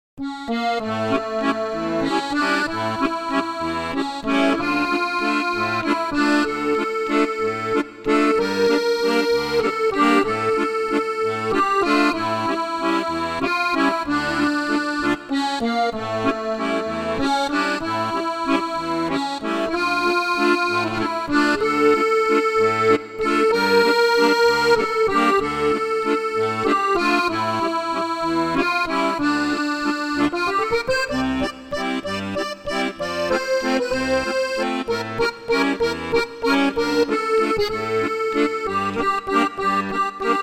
east europe

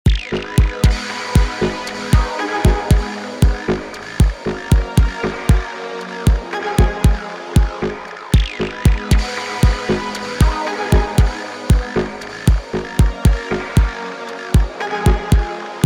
tal noisemaker chill ambi

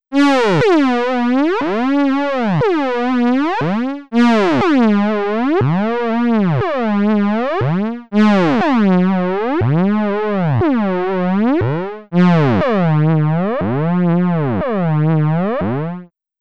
ring modulator

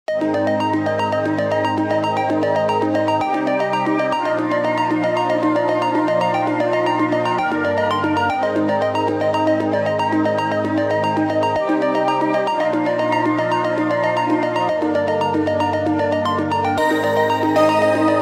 loop engine

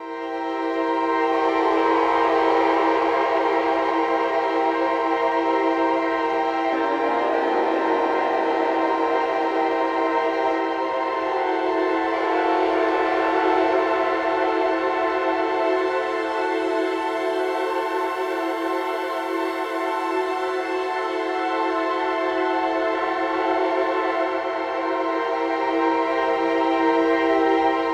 crying violins

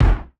stomp; nova sound

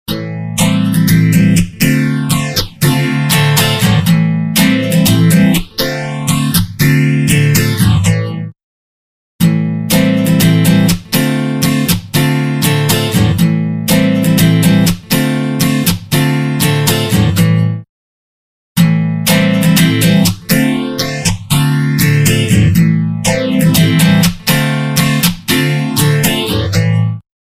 instant phaser mk ii

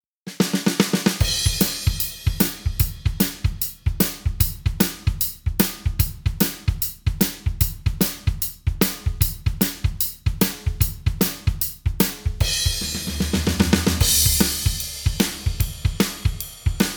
boogie

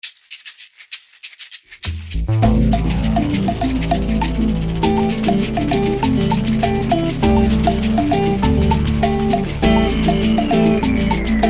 caxixi

